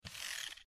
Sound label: Short Squeaky Mouse